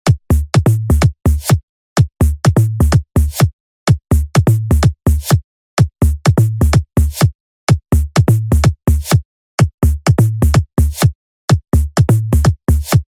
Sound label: beat and bass